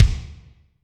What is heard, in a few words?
Kik Foot HL, Drum Machines Alesis D4